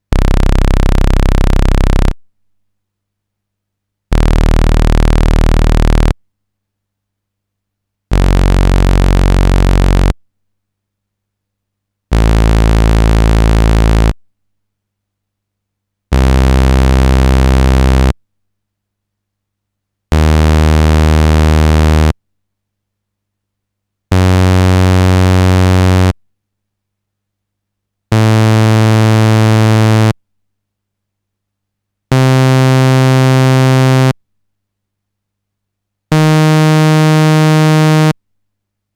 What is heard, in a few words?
Saw, synthesizer